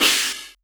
Elektron Monomachine, Drum Machines

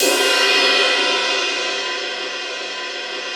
SUSP. CYMBAL; ORCH.PERC.; Instruments & Percussions; InVision Interactive; Lightware VOL-1

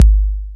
TR-808 Kick

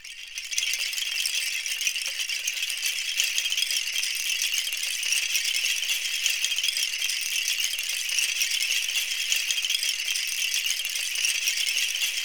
BAMBOO, Percussion; ILIO; Synclavier Percussion 2; World & Orchestral

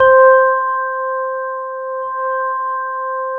C4, Roland JV 2080 CLUB ORGAN

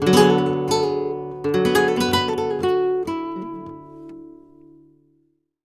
Flamenco Guitar Frase; Best Service ProSamples vol.46 - Flamenco

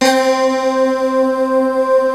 STEEL + VOX, ENSONIQ VFX; Rubber Chicken Software Sample Bank

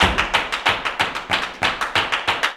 JALEO TAP, Flamenco

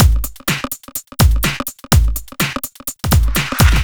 Full, Drum Loops 125bpm, Nu Dance Electro; Breakin Riddim

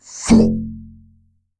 tsk, tubevox dry; Q Up Arts; Denny Jaeger Private Collection

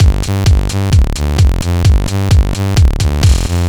130 No Snr, 130bpm; Nu Dance Electro; Zero-G; Soundclash; Combi Loops; After Eights

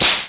WHIP

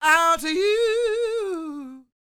SING, Vocal Planet Blues Soul BLUESY; Spectrasonics

